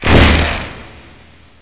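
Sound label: hit player, medusa; Hexen II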